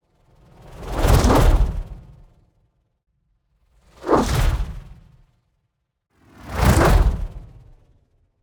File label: Whooshes; SoundBits; Whooshes & Impacts 2